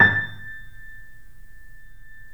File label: A, The Piano; InVision Interactive